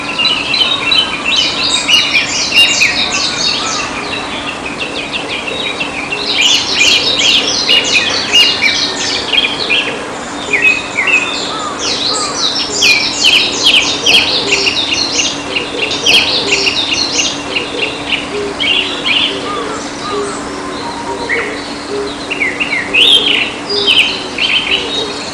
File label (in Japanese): kurotugumi